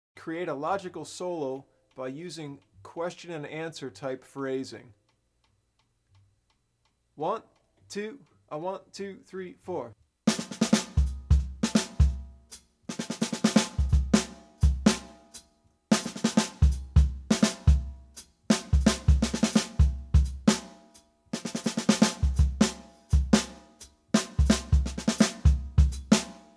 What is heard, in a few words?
Question and Answer Solo, Bop Drumming; The Art Of Bop Drumming; Riley